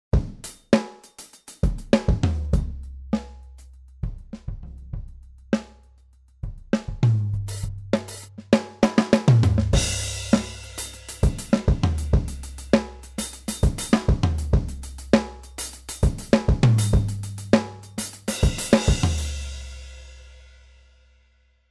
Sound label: Leedy Kit Dry